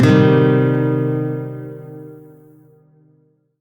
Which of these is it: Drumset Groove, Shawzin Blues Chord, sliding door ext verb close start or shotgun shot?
Shawzin Blues Chord